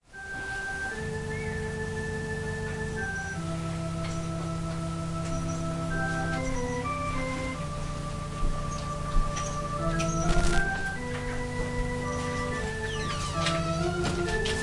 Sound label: Organ prelude